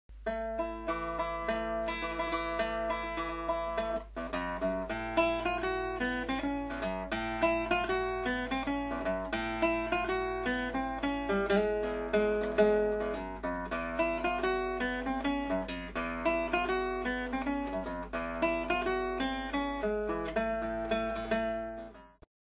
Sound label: Syncopation fingers, guitar; Jolly Guitar